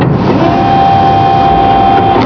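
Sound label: DOOR ELECTRIC; MOVEMENT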